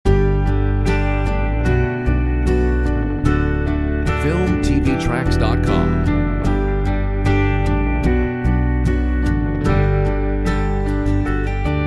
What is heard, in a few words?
no vocal